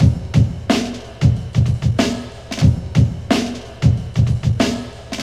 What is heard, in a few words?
92 bpm drum loop A sharp key; drum loops